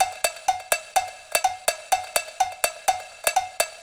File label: Cowbell Loop